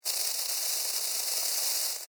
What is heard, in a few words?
Beetle Burrow, Animal, Beetle, Burrow, Dig, Insect, Bug